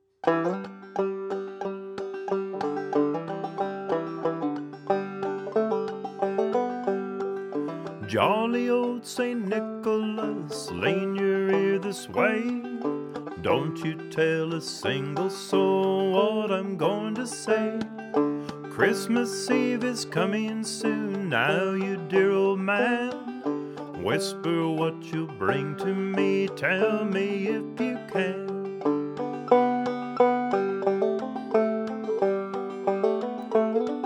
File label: jolly vocal, banjo; Christmas